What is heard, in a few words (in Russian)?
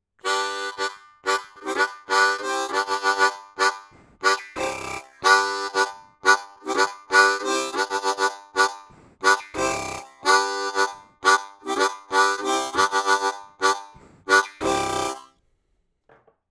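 Октава МК 319 rhythm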